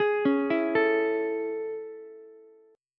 A Major 7th A, Ukulele; AM7 arpeggiated; 13,13,12,12; JGuitar

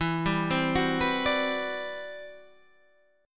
C Major Sharp 11th E

